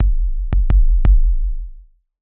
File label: Drum Waves, 86; Sugar Space